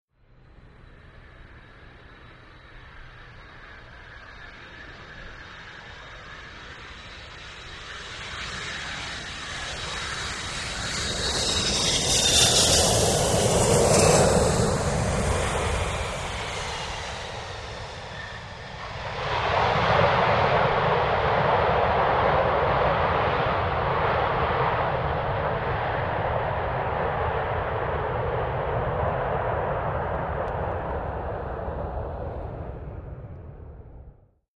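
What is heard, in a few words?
Large Jet Landing Reve, jets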